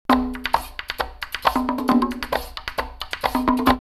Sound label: Brazil 134, drumloops World Ethnic; X-Static Goldmine